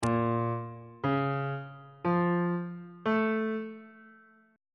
a sharp melodic minor 7th chord 1 is a sharp minor major 7th